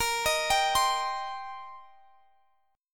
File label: C Minor Bb; Cm/Bb arpeggiated